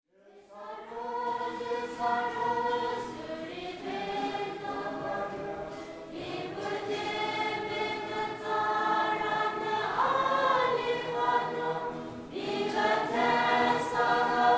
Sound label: carol